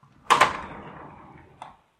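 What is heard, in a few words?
sliding door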